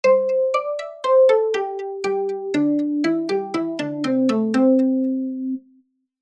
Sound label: lovely chinese music sms tone ringtone